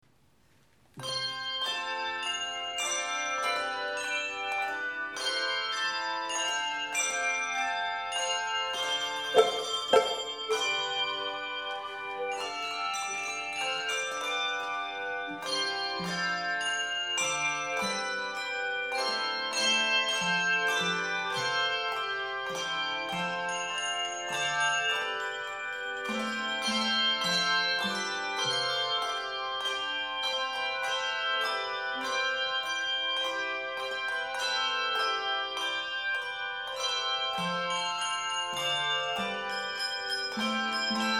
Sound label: bells; immortal Inv God Only Wise